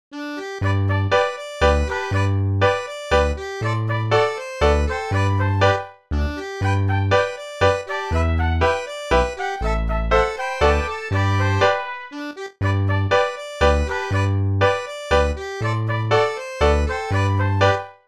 mazurka; de lapleau

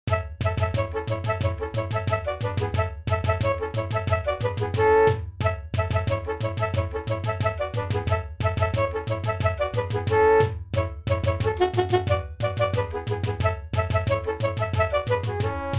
avec batterie; cochinchine

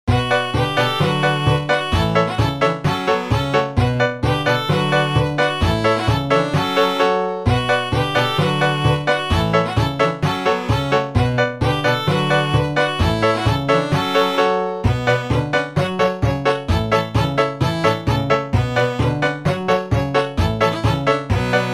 trio facile; langonnet

